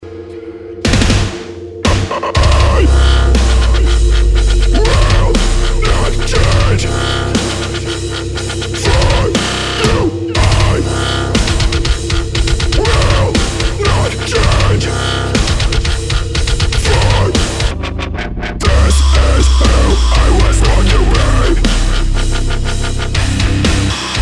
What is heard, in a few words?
Dub Metal